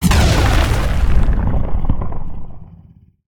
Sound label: Leap Attack Impact Swt FLAME; Evolve; Meteor Goliath